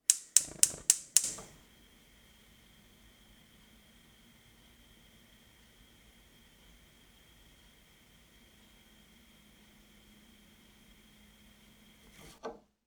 Household Stove Gas Ignite Run Off Long Oven, kitchen; SFX Bible 6